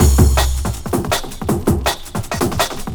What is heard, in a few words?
breaks 162